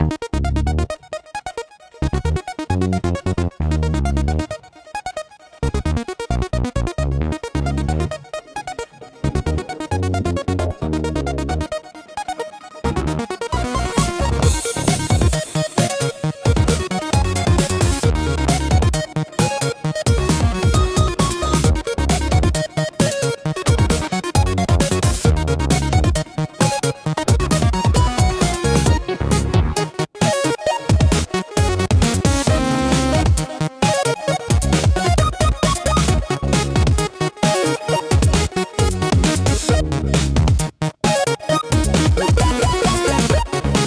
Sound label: dystopian spy electronica, instrumentals